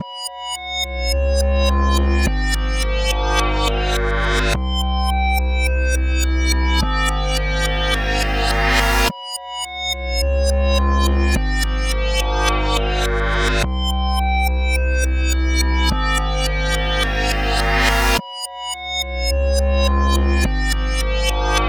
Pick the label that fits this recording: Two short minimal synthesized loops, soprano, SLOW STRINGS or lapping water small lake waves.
Two short minimal synthesized loops